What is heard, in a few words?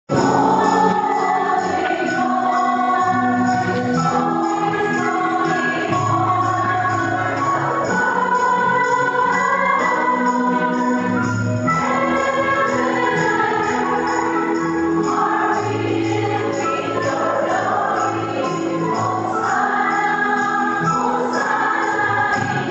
Holy Holy; Pentecoste 2011